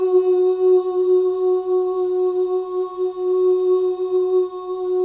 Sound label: BGV OOS; Spectrasonics; Symphony of Voices